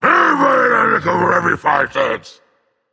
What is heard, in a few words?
grumble, dragon; fafnir